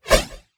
blade 1 hand bound swing

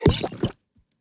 potion use; skyrim

